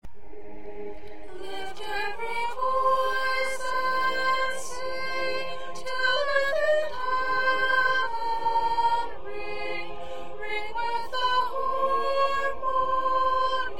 Soprano, choir

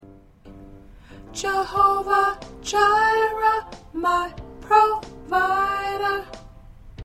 Soprano

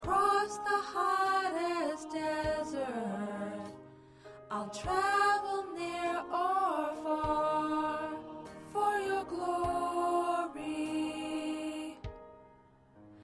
Alto

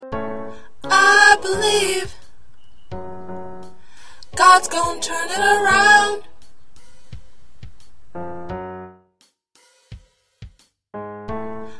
1st Tenor

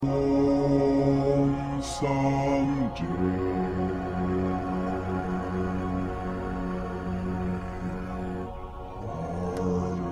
Bass 2

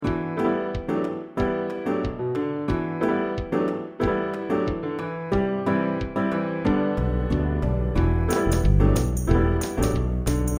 Accompaniment

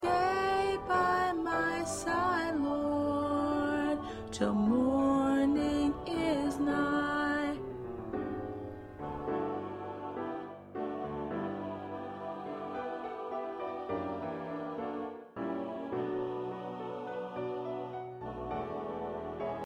Lead Tenor

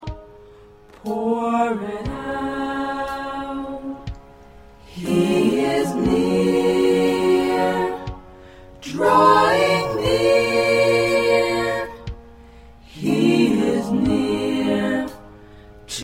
SATB

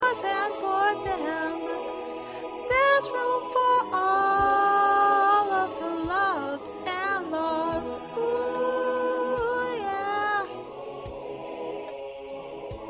Lead

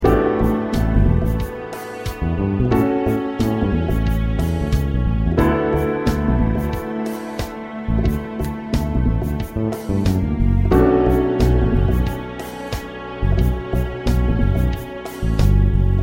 Accompaniment, choir parts

